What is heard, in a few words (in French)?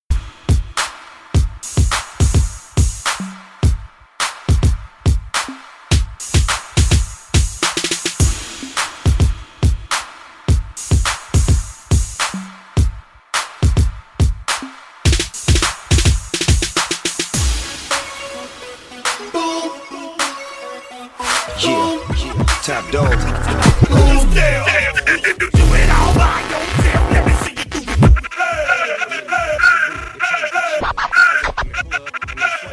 le hip hop